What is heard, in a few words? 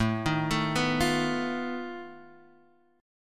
F 7th Flat 5th A